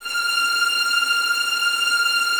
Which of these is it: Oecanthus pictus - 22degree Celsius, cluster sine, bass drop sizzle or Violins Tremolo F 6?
Violins Tremolo F 6